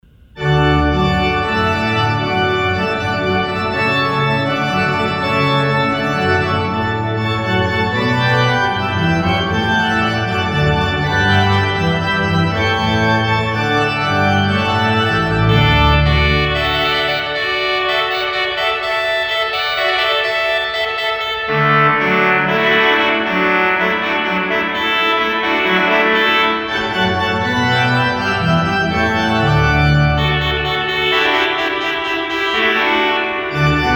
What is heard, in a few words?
Hornpipe; Handel